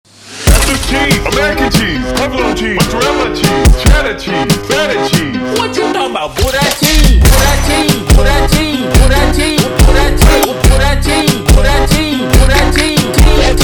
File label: Brega super Slowed; funk